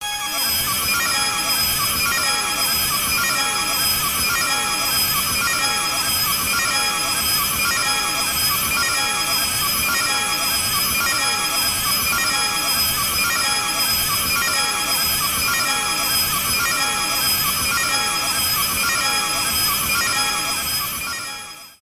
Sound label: space base